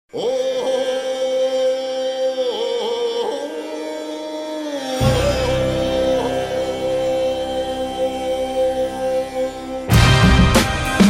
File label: Fight Groove; Afro Samurai The Game OST; PS3; Xbox 360